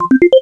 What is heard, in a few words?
click